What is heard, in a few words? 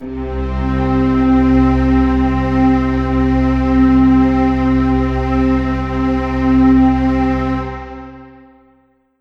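Yamaha SY22 Full Strings C2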